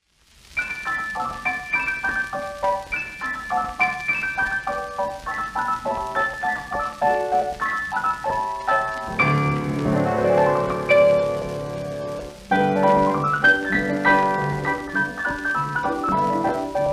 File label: piano